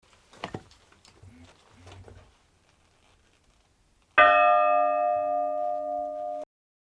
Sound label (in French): cloche; Pisieu